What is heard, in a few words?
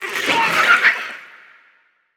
Ability Gooberball Fire; Evolve; Gorgon; Lurker